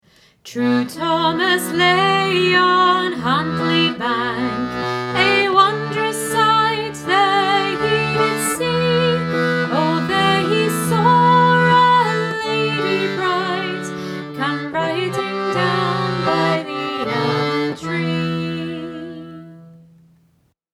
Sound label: Thomas the Rhymer normal chords